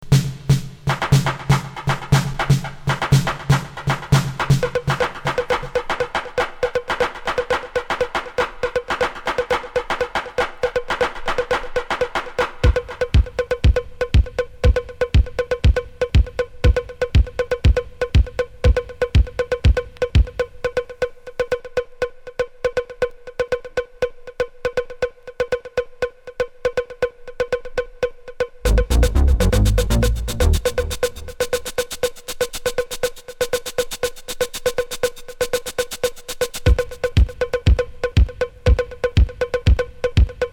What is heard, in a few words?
Bonus Beat